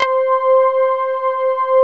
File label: DOP PLUCKS; Pro-Rec - Synthsonic; JV ANALOG2